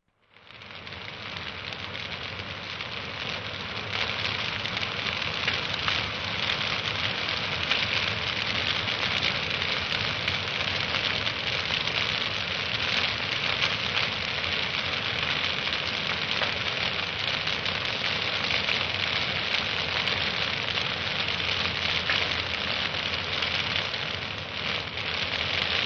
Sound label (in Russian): Zvuk lesnogo pozhara